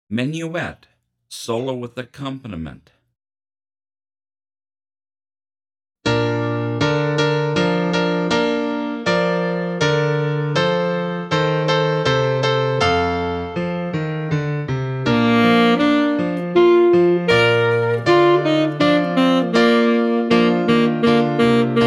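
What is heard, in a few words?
Menuett Bari Full, Bari Sax; 40pct Slower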